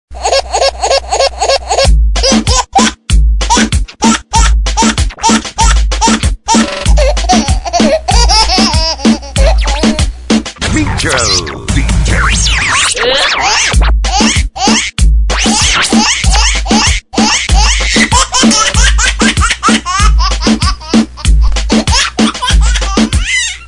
Funny Baby Ringtone

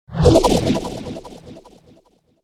Wobble Past; DJ sounds

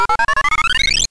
tones

